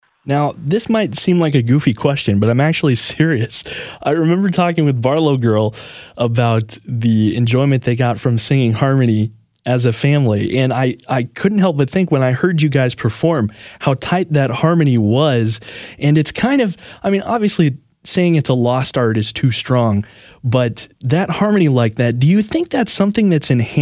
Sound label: Close harmony